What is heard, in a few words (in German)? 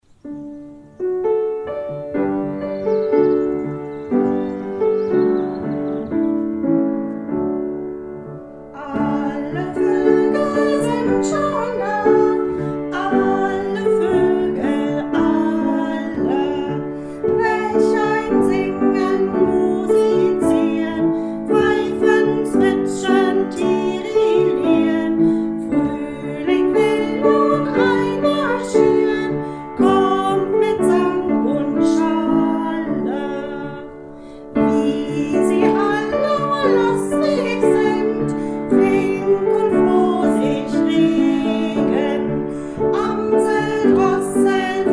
alle voegel hauptstimme